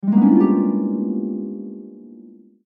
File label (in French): Harpe